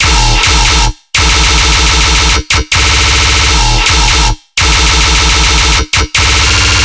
Bass Loop D# 140BPM, Dubstep

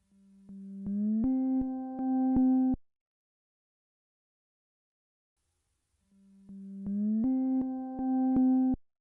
160 BPM G Min Reverse Pluck; RnB; Loop Stems; Cymatics; Aroma; 2022 Melody Collection; RnB Pt 1

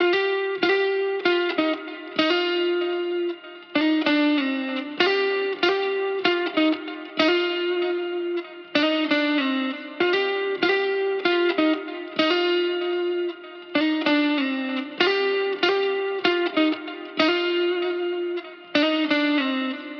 96 BPM C Min Lead Guitar; Cymatics; Miami Guitar Pack - Pt 2; Rum

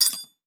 Percussion, Hip Hop, Drum One Shots

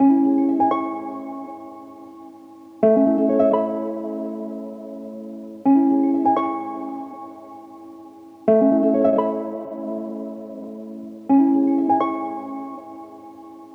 Harp Loop Wet - 85 BPM G# Min; Melodics; Cymatics - Eternity Sample Pack

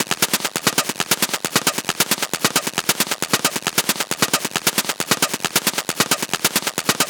Perc Loop 135, Techno; Ghosthack; Techno Sounds 2025

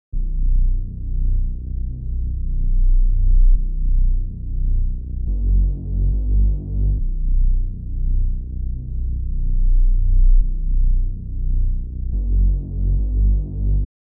140 BPM B Min Bass; Melody; Loop Stems; Cymatics; 2022 Melody Collection; Drill; Sacrifice

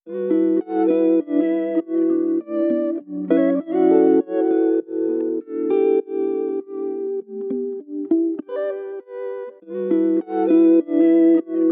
100 BPM E Min Guitar, Afrobeats; Melodies; Cymatics; GHOST (Beta Pack); Alora

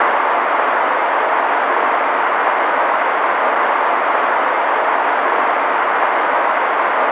Texture Loop, Techno; Ghosthack; AC2024 - Day 1 - Techno Sounds 2025

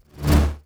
Jolt Standard

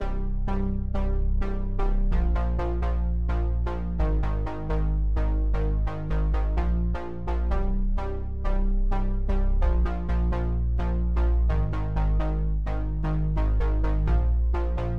128 BPM C Min Chords; House; Loop Stems; Cymatics; Breakdown Loops; Golden Hour

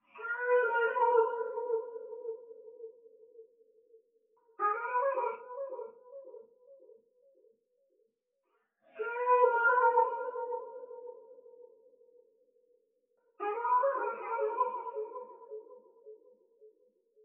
102 BPM G Min Vocal, Afrobeats; Melody; Loop Stems; Cymatics; Know You

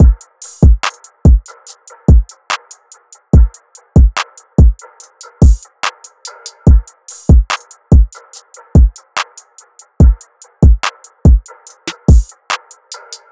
Drum Loop, 144 BPM; Layup; Cymatics - Infinity Beta Pack 2.0; Infinity Drum Collection

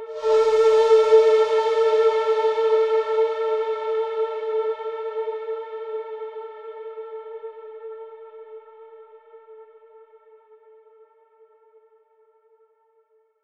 Eerie Ambience - A; FX

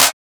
Clap, Techno; Techno Sounds 2024

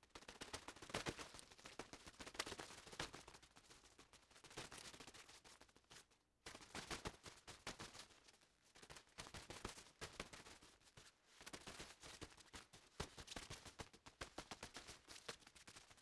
Bug Flapping Wings; Fairlight Sound Lib

